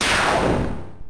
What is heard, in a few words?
scythe burst